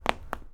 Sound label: Back Crack